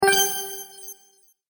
Bright Magic Bonus, video game

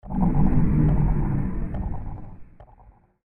Asteroid Storm, science fiction sound effect

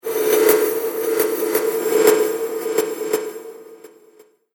Abstract And Fragmented Hits; Abstract And Fragmented Hits 2; Miscellaneous Impact Sound Effects; Impact / Explosion Sound Effect; Andrew Fleming

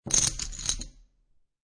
Poker Chips Stack Of Chips Dropping On Felt

